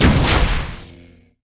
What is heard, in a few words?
frost arrow; weapons; dota; drow ranger